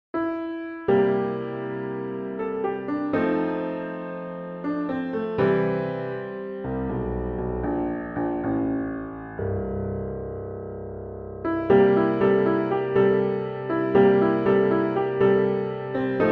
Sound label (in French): petit blues; Champlet Laurent